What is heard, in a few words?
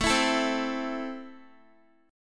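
A Minor, Guitar, chord strum; x,12,14,14,12,x; strummed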